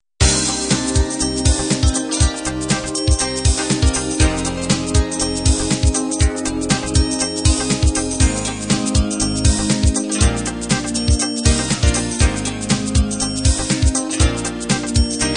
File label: trendy beat intro